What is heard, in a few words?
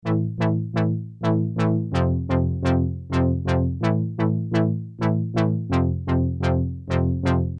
poly d uni mode